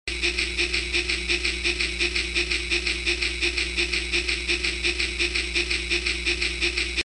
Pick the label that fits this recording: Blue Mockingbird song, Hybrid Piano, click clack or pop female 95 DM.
click clack